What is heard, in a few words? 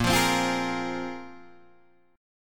Bb 7th Suspended 2nd Bb, Guitar Open D; 8,8,10,11,11,8